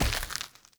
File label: Walk Default, Footsteps; Avakot; Friendly